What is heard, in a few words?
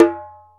Ashiko De; Multisamples; Digital Sound Factory Planet Earth